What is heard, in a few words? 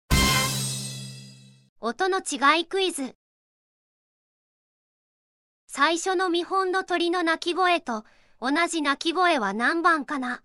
quiz bird